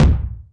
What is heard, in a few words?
kicks, Bass Drum